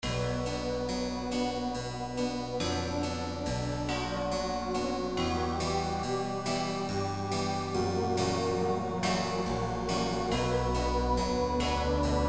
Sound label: movie acoustic despair